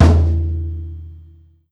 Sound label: Tom Low